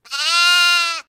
bah

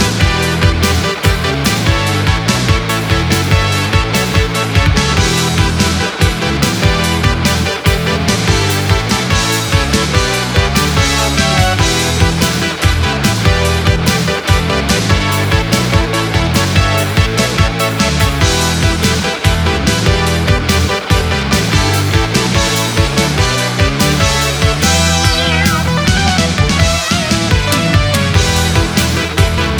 Mission Complete Loop; Galaxy of Pen & Paper; Byte

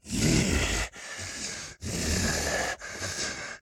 Walk Cycle Burns; The Town

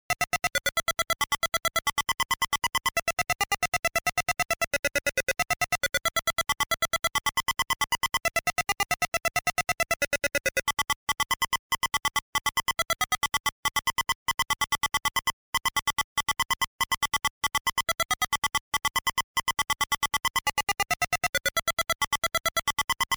(Field)

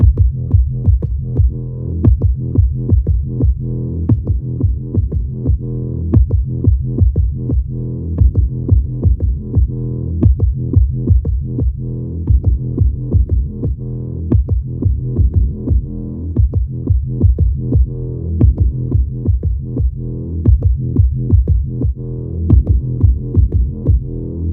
Naval Music Stutter Theme Naval Deck Fight; Battlefield 4

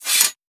sheathe sword; warrior

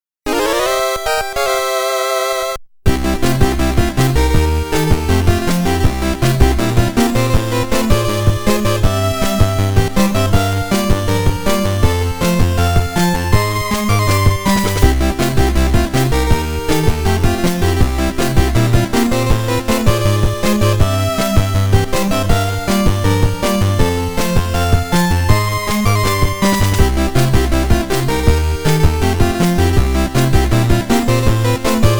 Level Up (Player Level Up Fanfare); Mouryou Senki Madara 1 & 2 Soundtracks Returns

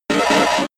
Roar

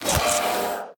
Ghost Attack; The Town

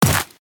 step

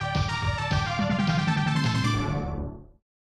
Normal Game Win; Godzilla Kaijuu Daikessen; snes

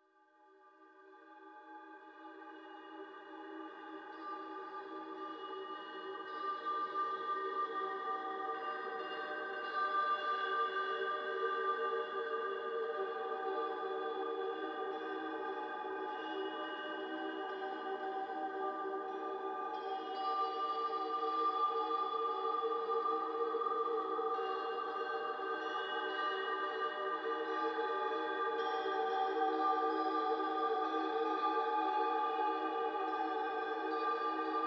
environment temple night chimes

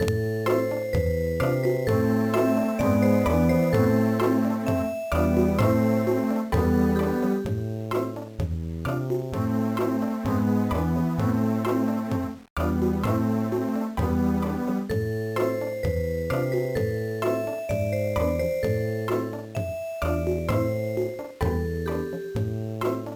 Instrumental

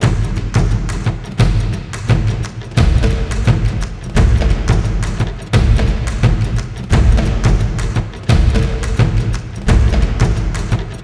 Siege Field Drums; Ninjago Monastery; lego universe macos windows gamerip 2010

